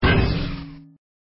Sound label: hero shield